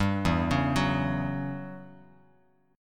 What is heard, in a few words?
E Minor 6th Add 9th E down Bass Standard